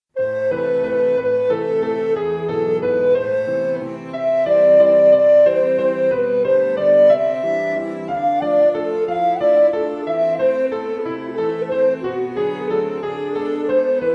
valse pour piano violoncelle; daneels pierre paul

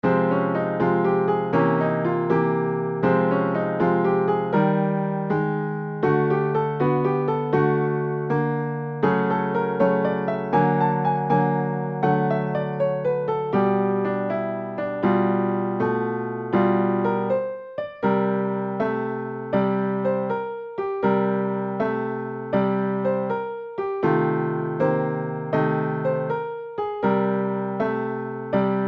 blues arabe

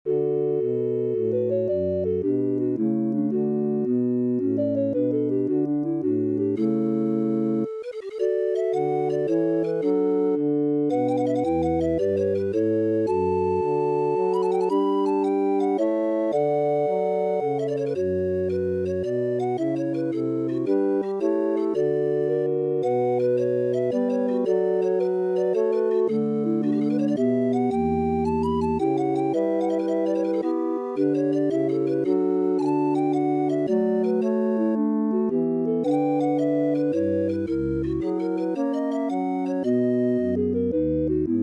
arias pour orgue

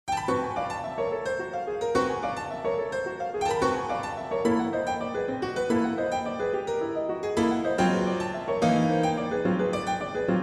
scherzo pour piano

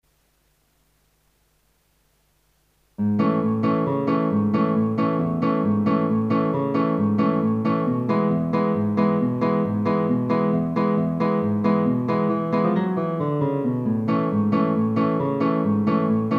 boogie woogie guitare

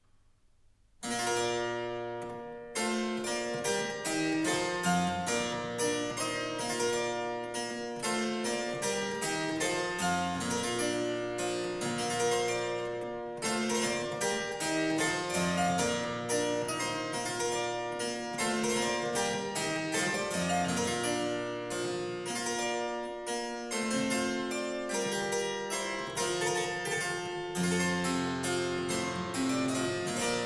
menuet; Louis Couperin

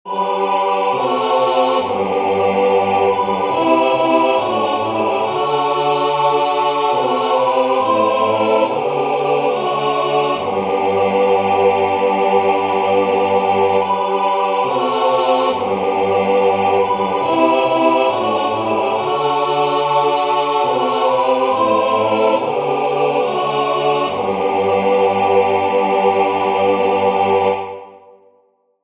psaume

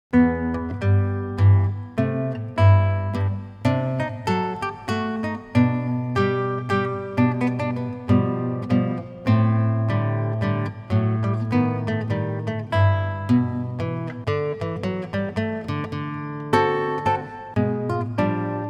mazurka guitare

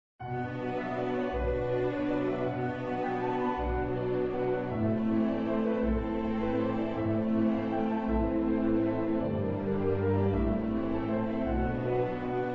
musique d'ambience; schoonenbeek kees

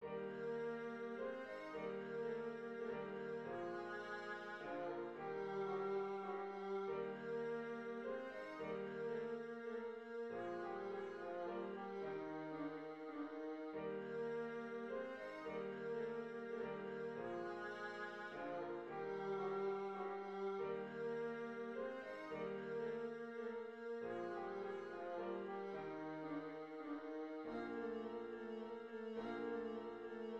danse allemande mib majeur; haydn joseph

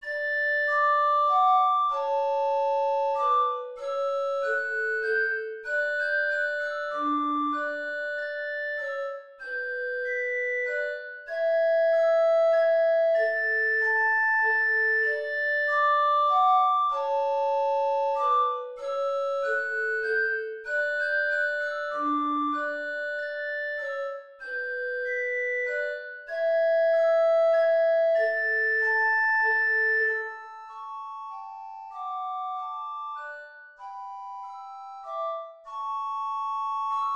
menuet majeur; purcell henry

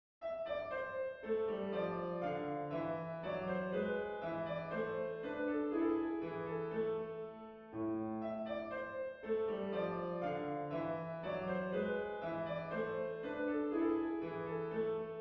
boura mineur; krieger johann

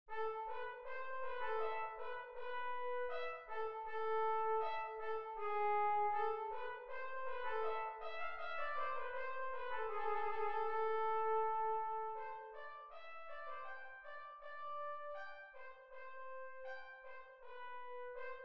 rigaudon mineur; telemann georg philipp

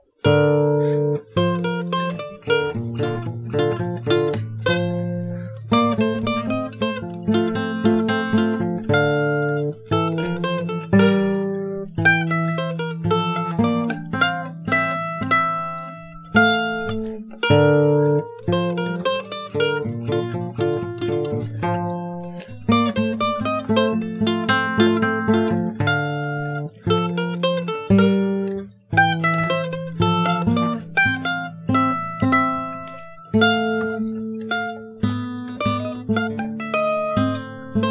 andante duos pour cors; mozart wolfgang amadeus

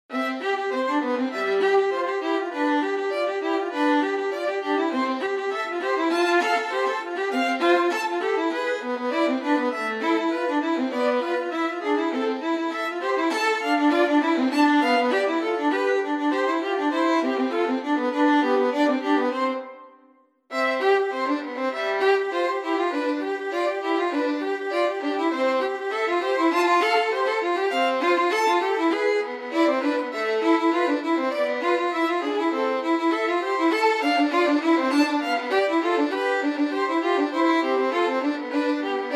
duo pour violons

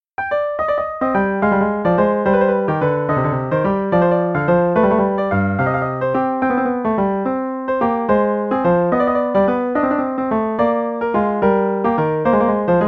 sicilienne ut mineur

